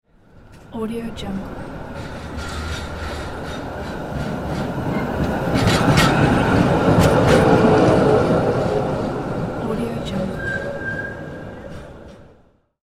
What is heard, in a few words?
streetcar passing close